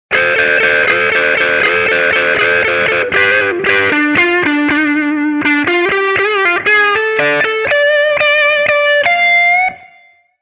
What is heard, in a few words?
LP Custom